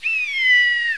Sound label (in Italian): canto ghiandaia